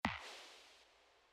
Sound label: click; Dark